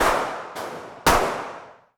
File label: execution style return fire